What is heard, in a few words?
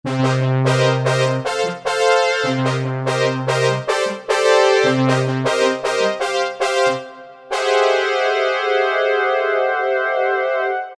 THE SYNBRASS